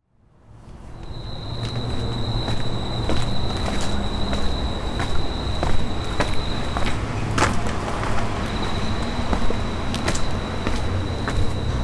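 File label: insect sounds, outside; Chino Cultural Complex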